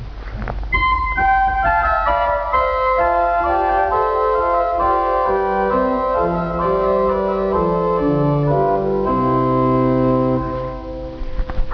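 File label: Prinzipal 4, organ; Vitus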